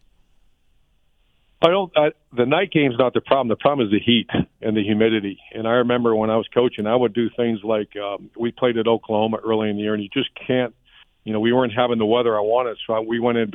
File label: Game atmosphere